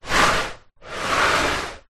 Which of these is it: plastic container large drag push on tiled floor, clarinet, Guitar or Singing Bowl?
plastic container large drag push on tiled floor